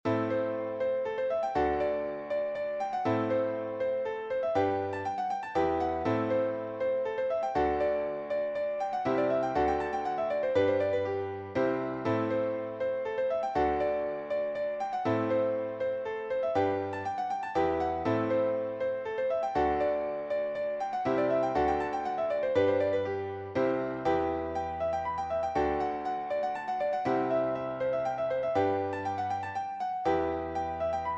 polskat

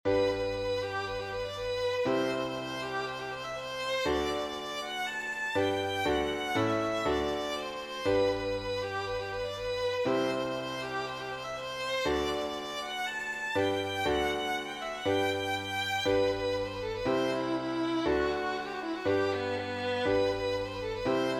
viinapolkka

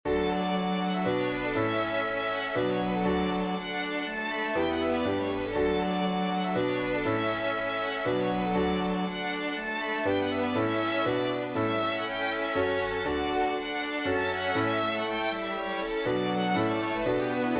poloneesi uudeltamaalta

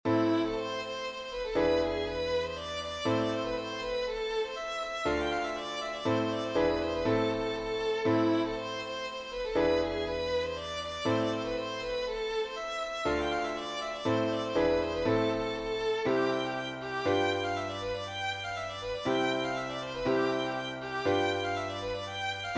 tappelupolkka

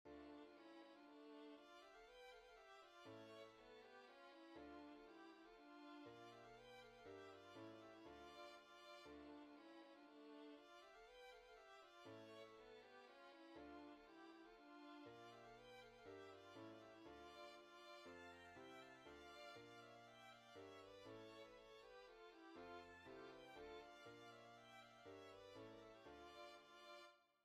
16-osapolska

